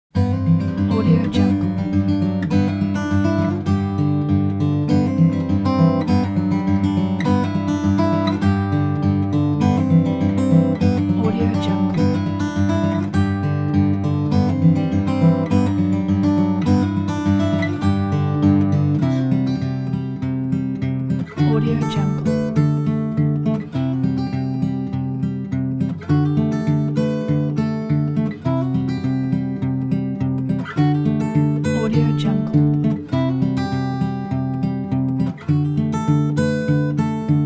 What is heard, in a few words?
beautiful acoustic guitar